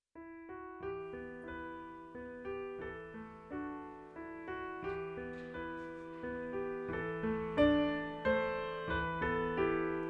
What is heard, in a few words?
Opening Instrumental